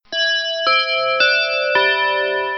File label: chime notice; funchat